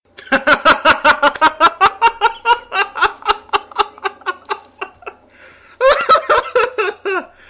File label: laugh; torchlight